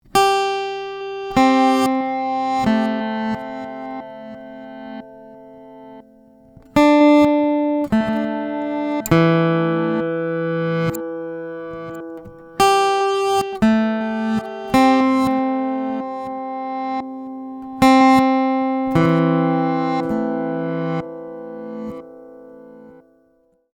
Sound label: Zoom A3 reverb reversed